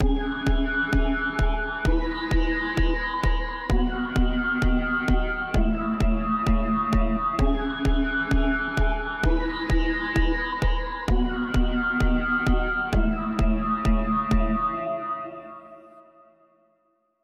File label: Chords